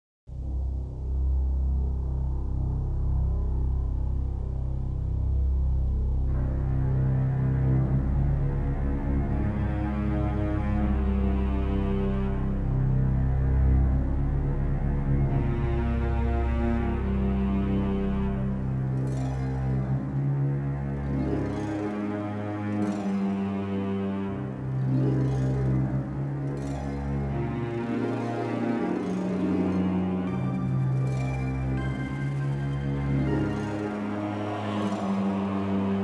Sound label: Ambience; Red Faction Music